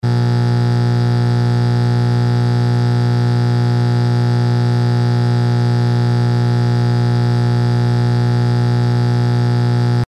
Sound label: 60 Cycle Hum